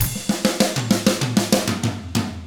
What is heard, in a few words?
HARD ROCK, Drums; ILIO; Double Platinum Drums 1